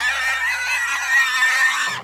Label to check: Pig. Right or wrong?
Right